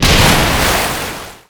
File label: Enemy Pirate Minion Hit